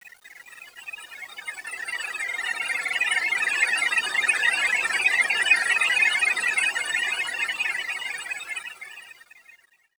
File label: Glassbreak Grain Texture, Chalice Well